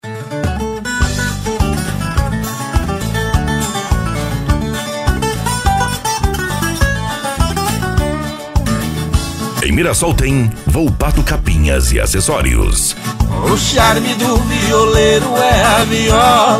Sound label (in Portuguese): pagode de viola